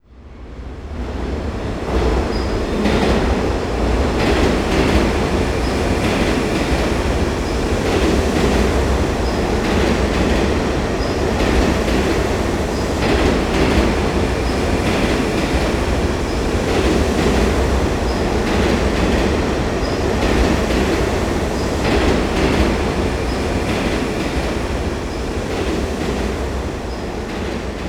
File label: Jingle Subway; Ollie King